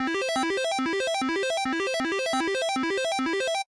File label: background; gambling (coinflip & slots)